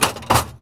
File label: Tool box; INDUSTRIAL